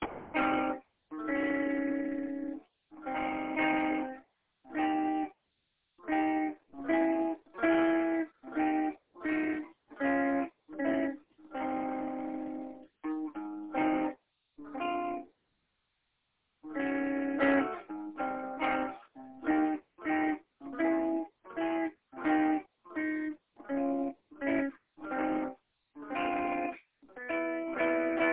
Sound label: golden age jazz changes